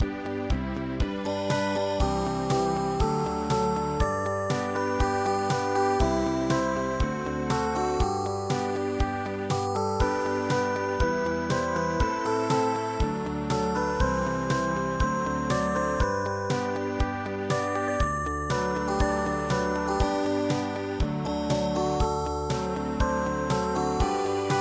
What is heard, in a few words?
ballade; La Marseillaise